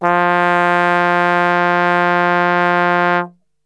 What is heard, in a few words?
F 2 Brass Super Section; Best Service; TRMBSTRGHT; 90 s Sample CDs